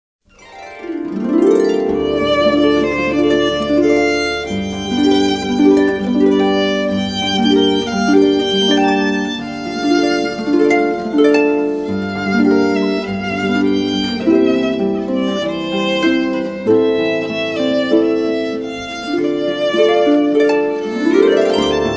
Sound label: duo violin harp traditional